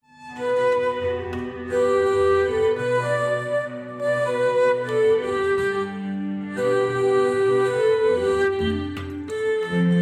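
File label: new age world music andean, folk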